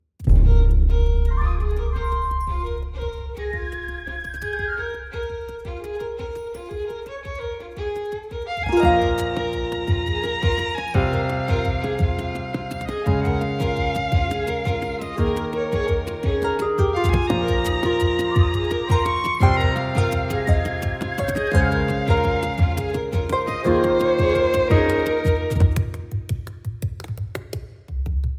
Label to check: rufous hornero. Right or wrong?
Wrong